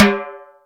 Timb L, Percussion

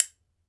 B.BONES, Ireland; E-MU Producer Series Vol. 7 – Old World Instruments

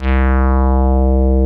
BASSVOX; Masterbits; Vintage Voltage; SQ+FX

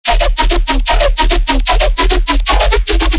bass loop messy 150 D#; messybreakfast; Virtual Riot

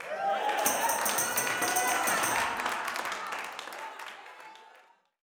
OUTBURST, Gospel; Spectrasonics; Vocal Planet

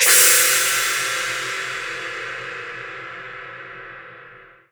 Crash, impact samples

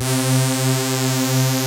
RESO GRILLE; Pro-Rec - Synthsonic

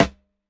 SNARE RIMSHOT; JUNGLE BEATS; ELECTRO KIT

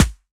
BD SD; Best Service; Dance Mega Drums

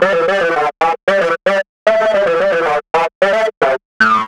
Funky Riff, Keyboards

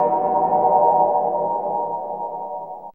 EERIE LOOP; ATMOS

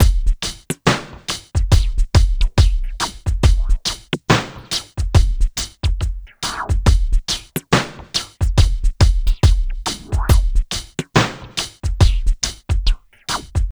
Filter Loop, Drums & Percussion, Electronica; Sonic Foundry (Sony Creative Software) - Electronic Point Blank

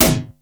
power noise, plastic drum; Crypton - DrumBase5000

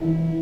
E-3, BOURDON PED, Organ

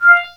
blips, opl3

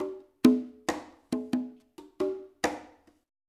CONGAS, LIQUID; Spectrasonics; Liquid Grooves 1 & 2

